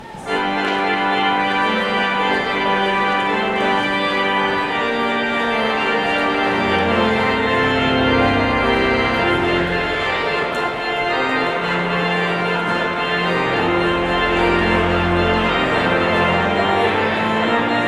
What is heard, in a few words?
Postlude